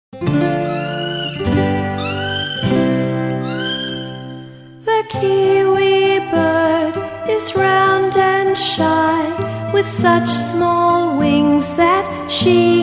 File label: Kiwi Bird